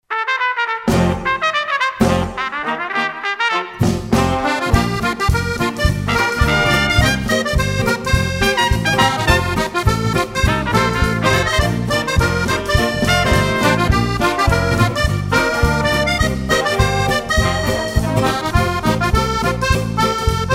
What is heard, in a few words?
ambiance 1900